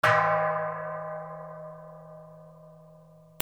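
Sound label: percussion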